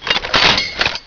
clip out

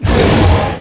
monsters pain; oblivion darkangels

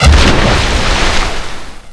grenade antidote; zombie plague

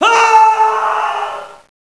death; hidden

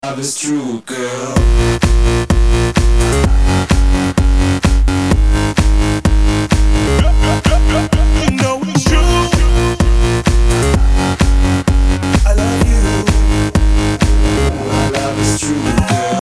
surf

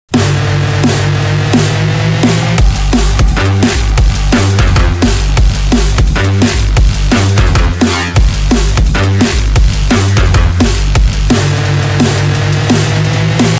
zm dnb

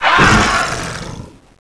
monsters death; oblivion darkangels

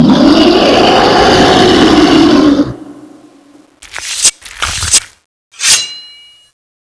knife; oberon

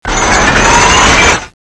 m134 ups in, weapons

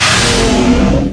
pain

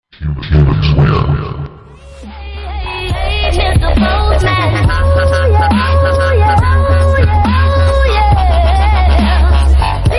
humans win

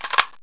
mp5 draw

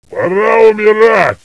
zombie burn

